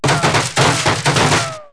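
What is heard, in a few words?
Cockpit damaged; PC Gamer IT CD 47; USAF; USAFDEMO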